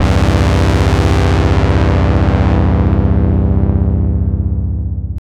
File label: 2 Pole Filter seep; IK Multimedia